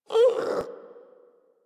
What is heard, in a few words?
Puppy Interact Affection Closed Mouth; Enemies; Kubrow; Warframe